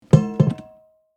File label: Pedal bin open lid; household